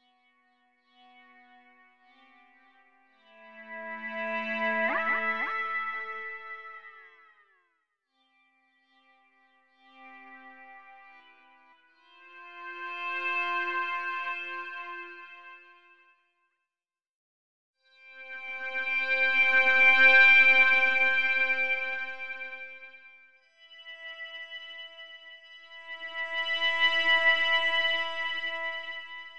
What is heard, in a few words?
Synth Loop (created on an iPhone)